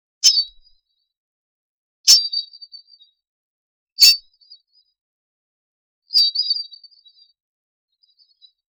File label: a mistic sword swishing; air